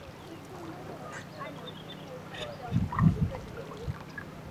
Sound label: at lake